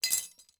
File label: ui drop metal; Rust